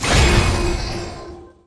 Totem Defence

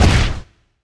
hit

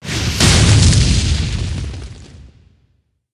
Area Attack; Deinke

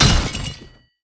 drop

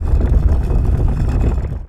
Stone Roll

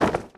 fabric

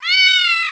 cat dead

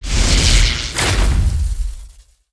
Elect cast shot

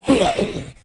Death

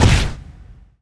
hit

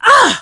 DMG

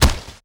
drop

